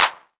clap; Street Anthems Sound Kit; Claps & Snares

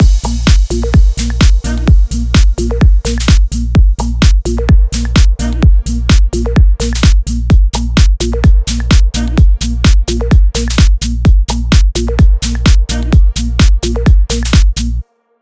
128BPM Mix, TechHouse; Titano; Vengeance Samples; Bonus TechHouse Kits

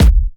Bassdrum; Vengeance Samples

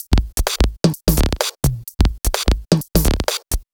Minimal Loops

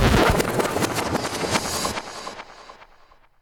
Vinyl FX and Scratches, FX Scratch; Vengeance Samples

